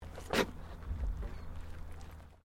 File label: Animal Alpaca Female Snort; Alpacas on a farm in Gippsland Victoria; Miscellaneous Animal; SoundEffects-Foundation1